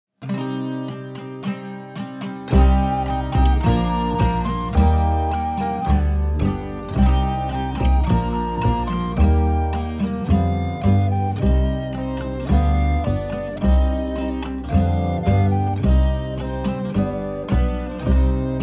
Version instrumentale

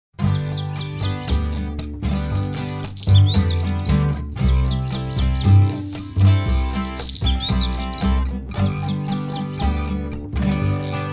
instru; cuicui et compagnie

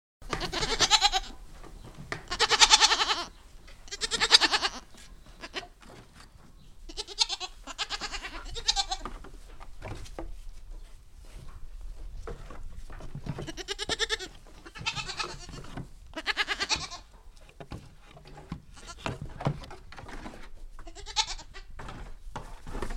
Chevreaux à l'étable, Ambiances Sonores d'Ardèche; Vernoux-en-Vivarais; Studio Les Trois Becs

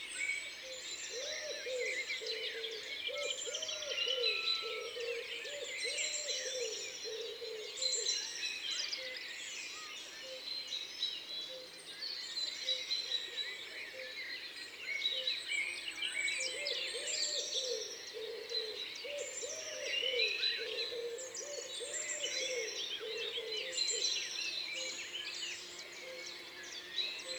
Pigeon ramier; Isere; Concerts naturels de l'Isere; Studio Les Trois Becs

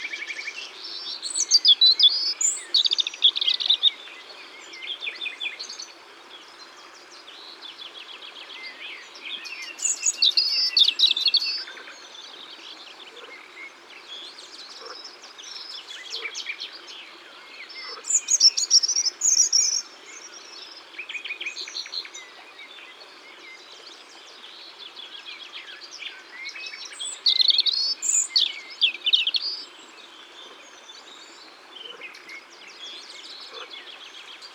Rougegorge Familier; Ardeche; Concerts Naturels De l'Ardeche

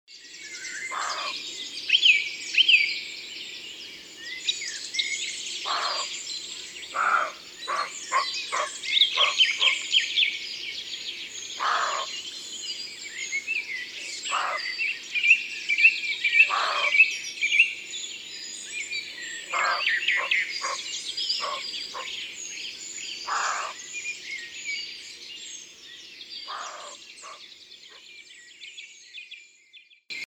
Chevreuil, Concerts Naturels de la Drôme; Studio les Trois Becs

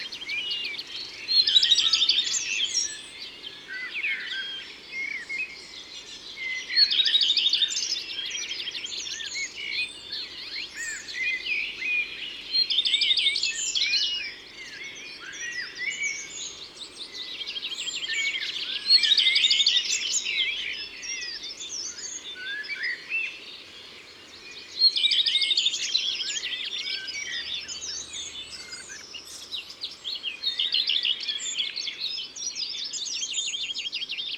Rougequeue a front blanc, Concerts naturels de l'Isere; Studio Les Trois Becs

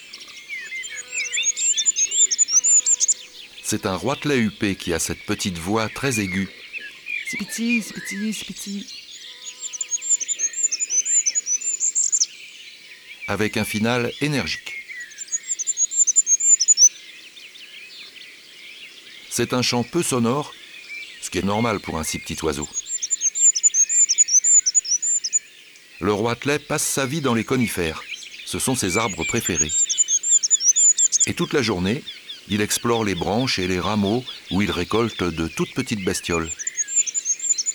Roitelet huppe; ABC de la nature

